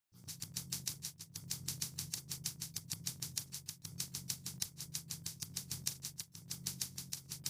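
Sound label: drum, separated component 1; drum pattern 1; Separation of Sound Sources by Convolutive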